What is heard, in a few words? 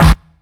warm sounding snare drum sample g sharp key, steel snare drum sample